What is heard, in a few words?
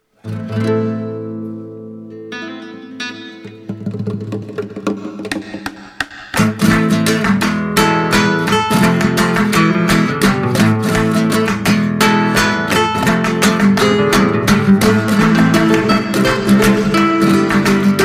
claptrak